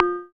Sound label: FX A, ANALOG; Sound & Vision - Gigapack II